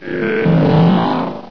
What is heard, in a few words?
baby panther idle; svencoop; custom monsters